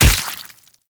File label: Splitter Gore Spew Burst; Warframe; Infested; Grey Strain